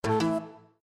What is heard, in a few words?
Garden Hardware Insert, windows 7